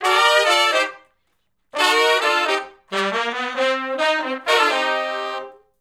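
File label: R&B Riff (C) harmony, Horncraft; Sony Creative Software; Crimson Blue and Fabulous Horncraft 4 RnB; Horncraft for R&B; Sections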